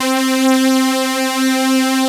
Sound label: POLY SYNTH; Wizoo - Powered Wave